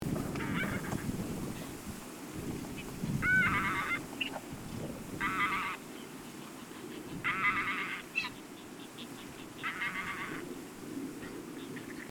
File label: Anser anser, Norfolk, UK